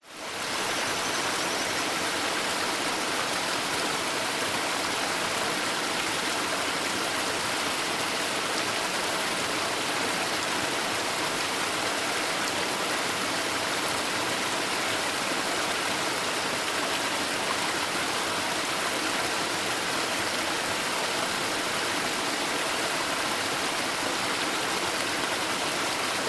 waterfall small multi step forest creek flow through tree debris; nature; zapsplat